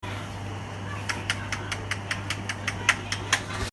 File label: Unpleasant sound